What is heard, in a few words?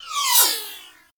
Swarm Cannon Projectile By; Warframe; Weapons; Corpus